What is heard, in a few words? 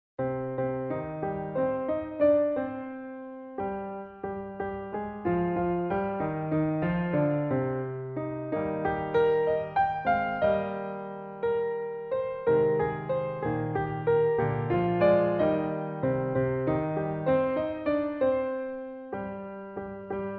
allegretto in c minor